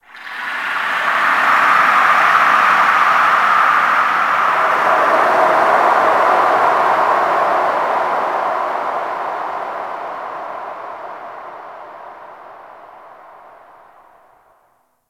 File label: Ocean Underwater Texture Medium; Ambience; Warframe; Grineer Ocean